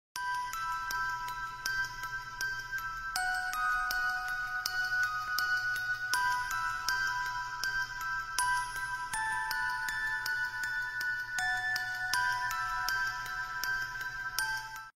INTRO de Terror sin TEXTO

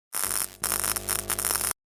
efecto de sonido glitch

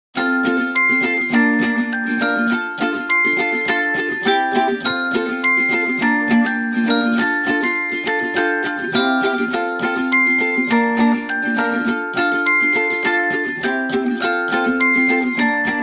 cancion para video de cocina musica para recetas de cocina happy; maxkomusic; happy upbeat uk

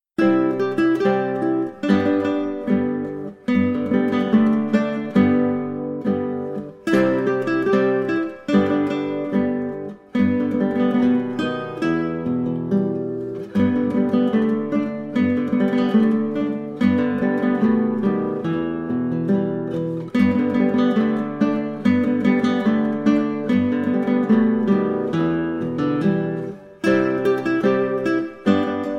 Danza peruana

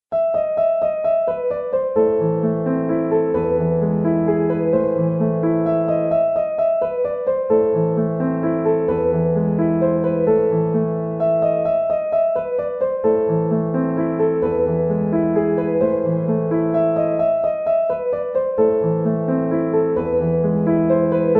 para elisa version corta piano; tutorial synthesia

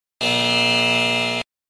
Efecto de sonido Error

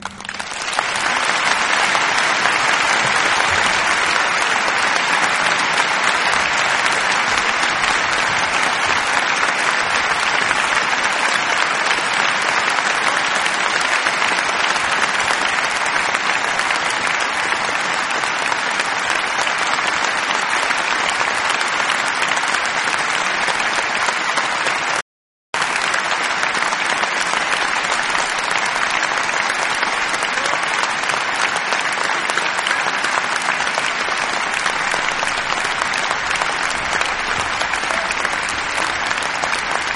aplausos

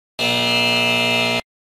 Efecto de Sonido Falla Error

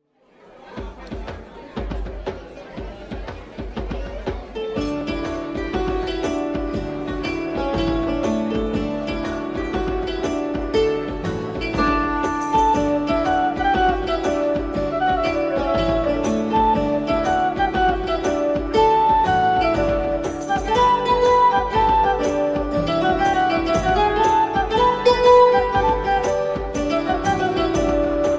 Música Medieval Taberna

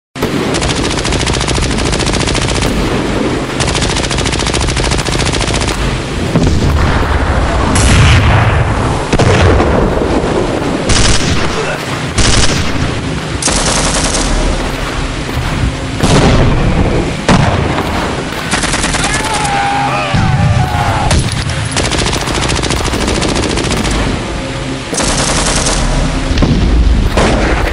Guerra Efectos de sonido

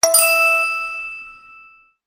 acierto efecto de sonido; pasapalabra; antena 3

